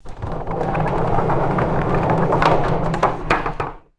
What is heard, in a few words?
wooden barn door rolling on track; doors